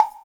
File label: Wood, Block Stick; Equipped Music; Loungin House